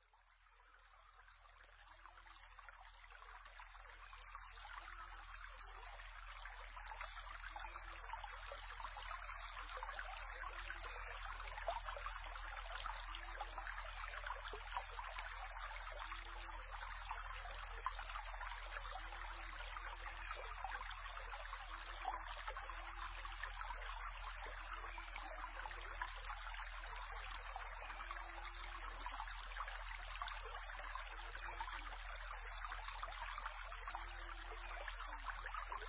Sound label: Forest Stream; relax